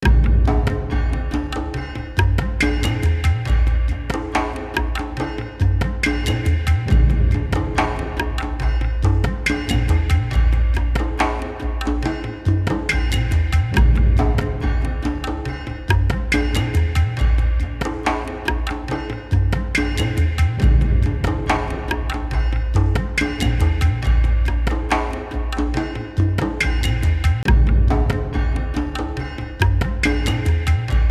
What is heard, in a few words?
Dungeon Rhythm; Ape Quest; PSP